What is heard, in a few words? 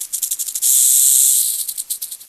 rattlesnake; ambient